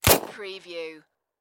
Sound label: Submachine Gun Thompson